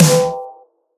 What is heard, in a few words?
Recon Snare